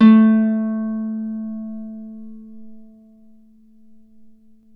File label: Celtic Harp, Plucked Strings